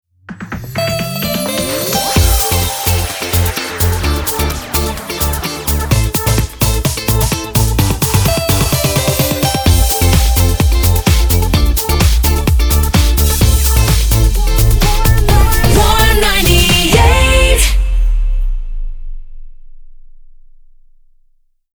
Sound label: Hot 128bpm Ramp, jingle; Warm 98